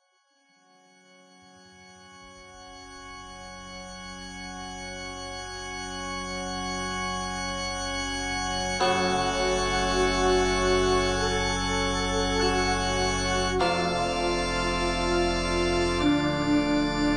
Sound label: Ambient Church Moody